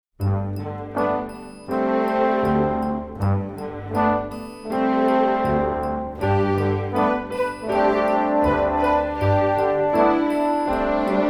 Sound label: Four In A Boat, recorder; recorder karate